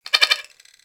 Beep Stutter; Warframe; Corpus

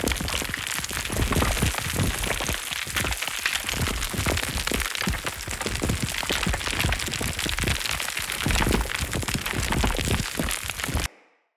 Root Spider Burrow Loop, Foley; Animals

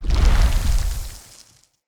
Healing Totem Cast; Fey; Ward

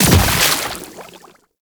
Fishing Spear Stun, Weapons; Venus Fishing Spears; Warframe

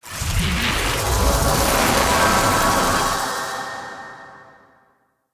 Upgrade; Kuva Lich; Abilities; Spawn

